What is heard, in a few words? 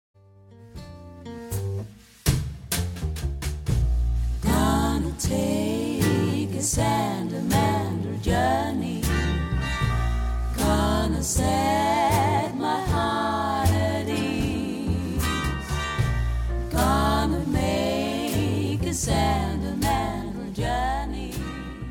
Swing